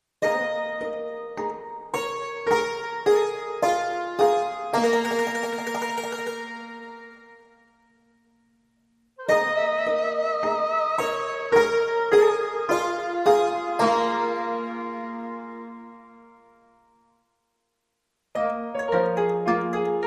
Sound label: No Bass and Drums in Bridge